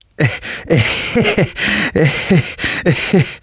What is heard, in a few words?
laughter, survivor voice